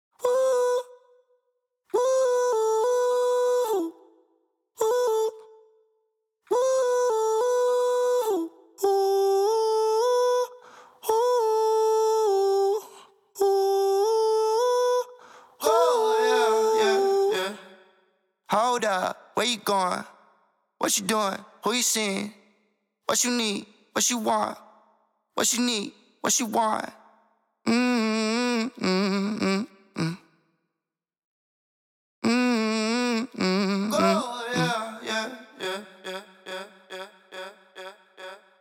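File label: R&B Hip vocals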